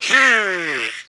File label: Death Sound; Donald